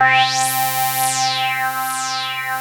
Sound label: LD C4, PPG, analog; USB Soundscan vol.49; Analog Fantasies; AKAI